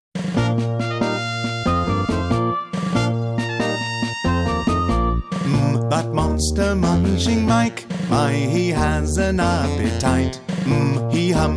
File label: ALPHABET SONGS